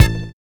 HIT, Hits; Houseworx

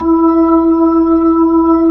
B-3, Jazz

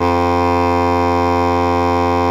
BASS SHAWM; SoundBytes - Early Patches

